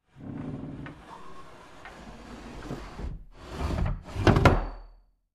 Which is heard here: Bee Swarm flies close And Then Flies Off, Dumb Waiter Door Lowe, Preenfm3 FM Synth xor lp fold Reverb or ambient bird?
Dumb Waiter Door Lowe